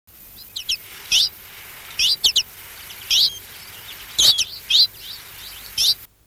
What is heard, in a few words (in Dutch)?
frater, trekroepjes